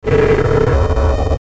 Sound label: Descend Electrical Buzz; Descend Electrical Buzz Pulse; Blastwave; Descends; Sound Design